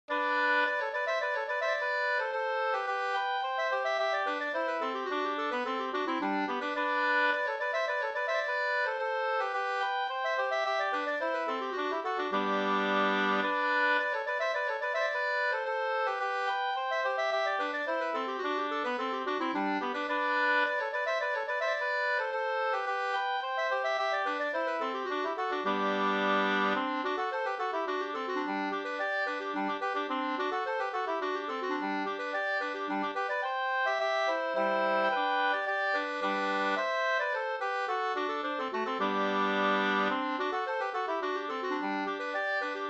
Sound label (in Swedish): polska G dur; Byss-Kalle